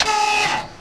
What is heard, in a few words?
hydraulic